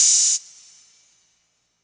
soft open hat a key, Royality free open high-hat tuned to the A note. Loudest frequency: 7669Hz